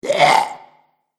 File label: Zombie - Sore Throat; Zomby Sound Effects; horror